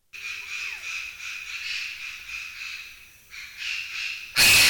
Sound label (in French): Oiseaux